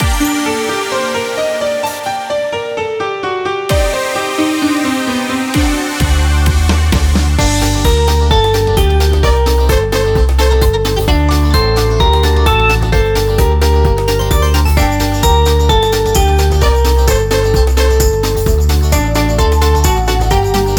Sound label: karaoke